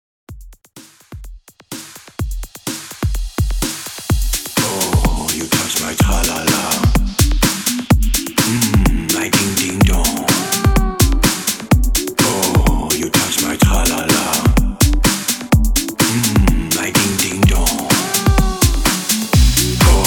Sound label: House Mix 126 BPM